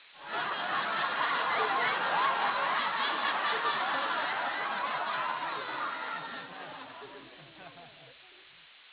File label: laughter